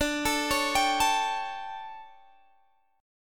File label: D-Major 7th Suspended 4th, D, Guitar, Standard; DM7sus4; arpeggiated; x,17,19,18,20,17; down; capo=9